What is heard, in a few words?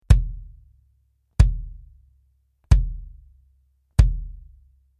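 BASS Ctd Reso Bury Beater, bass drum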